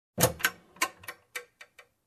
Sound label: Closing a compartment door